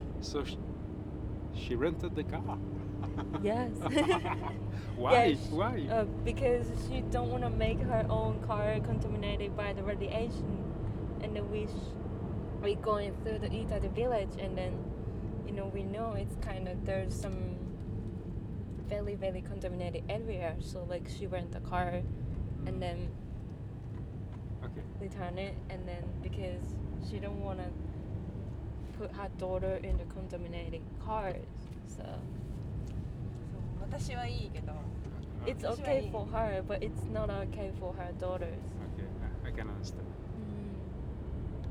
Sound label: journey to minamisoma rental car; FUKUSHIMA